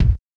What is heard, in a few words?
kick; space kitty